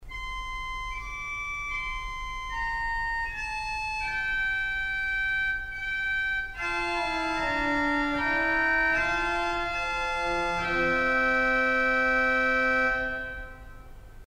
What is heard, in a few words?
Gambette 4; urkutieto